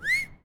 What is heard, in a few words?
catcall mouth